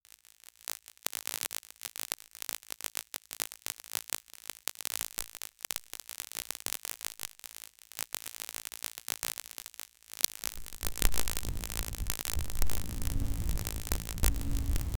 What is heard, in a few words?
vitality power electric